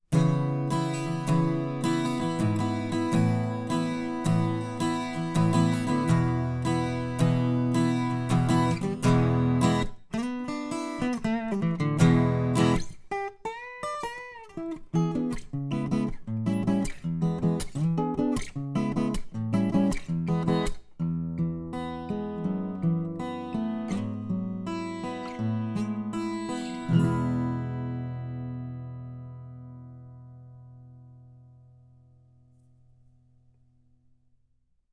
Ac Gtr

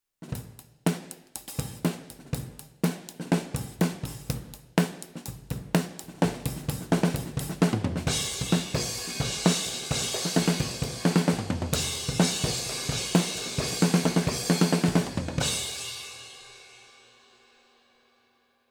Kick, Head On 10cm Outside OH

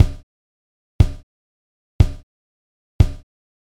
Kick No Comp

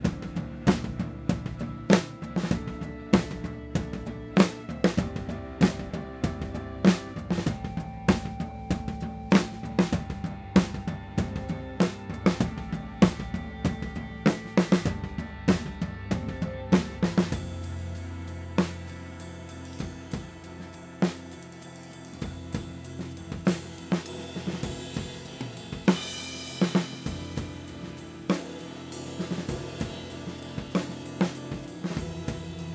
Baffles Overheads Duvet

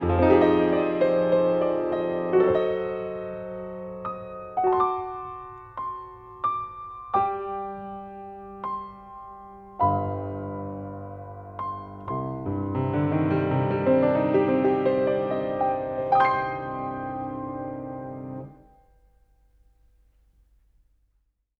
Dual Array Facets Piano Outside XY

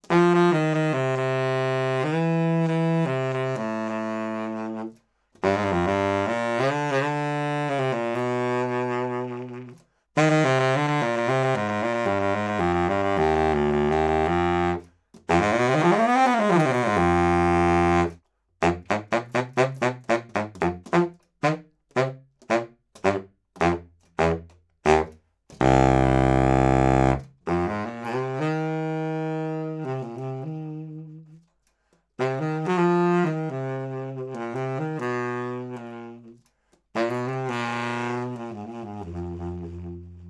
Sax Baritone 50cm L Mid

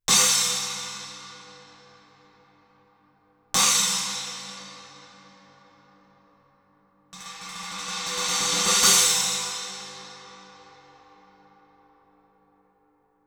Cymb China Bell

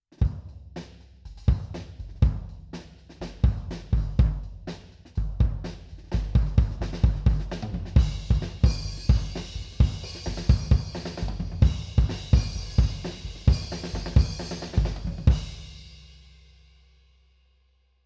Kick, Head On 10cm Outside D112 RR

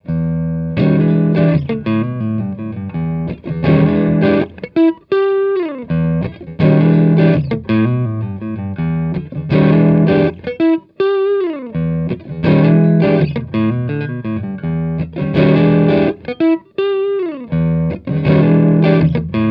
Elec Gtr Location Small Room Corner

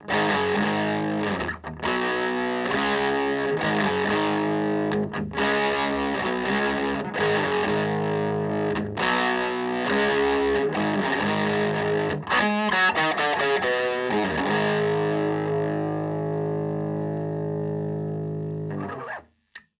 Gtr Mix Stereo; Mixing Secrets

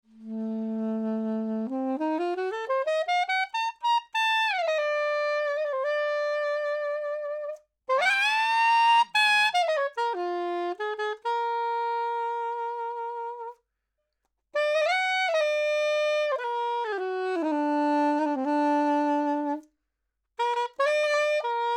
Sax Soprano 20cm Low Mid

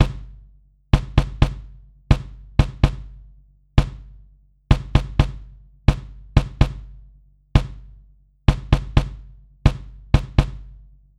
Kick Proc With Sample

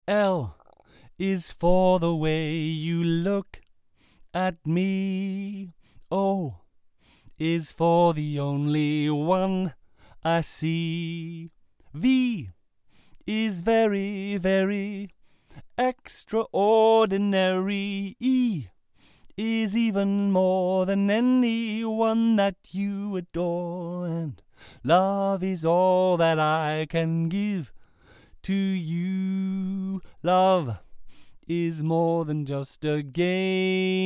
Spots Off Axis Coloration 135deg Fig8

